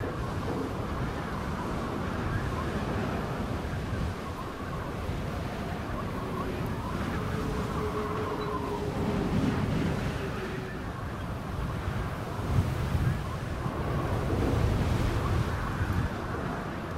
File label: winter wind